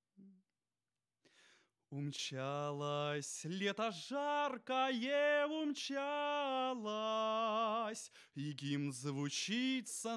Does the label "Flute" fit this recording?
No